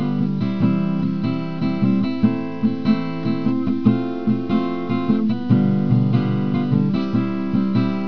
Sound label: acoustic guitar